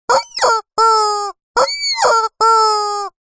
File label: toy squeaky rubber chicken squeeze hard